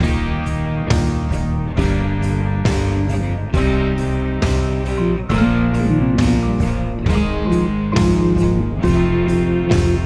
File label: Eb C Chromatic Scale, brass